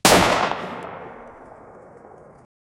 a single shot; SFX Engine

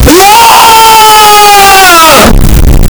short raw scream; 23 secon; sound effect

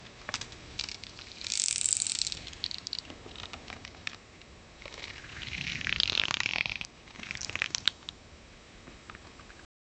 a large dinosaur stretche

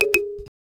african flavored tab swit

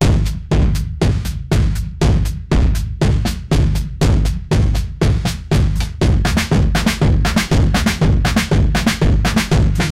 thavil drum beats in fest; festival

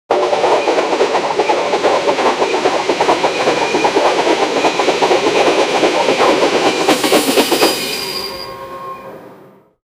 old train choo choo steam

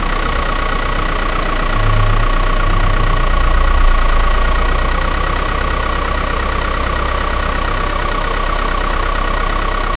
braking sound of the bus

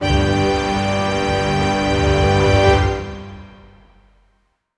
orchestra, branding

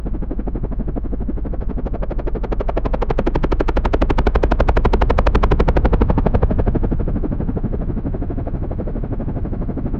helicopter

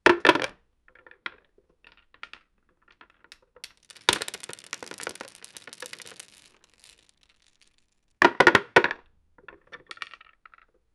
pencil crushing on the ta

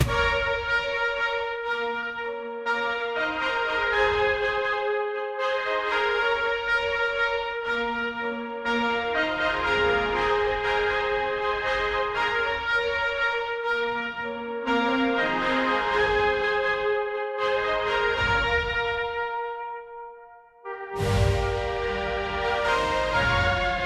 formal military salute lo, marching band

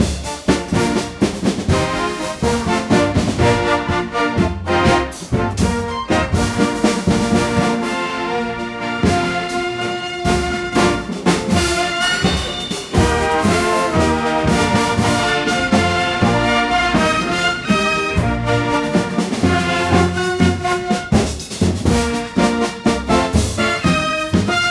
festive patriotic parade

